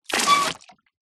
leisure toy water gun squirt water into swimming pool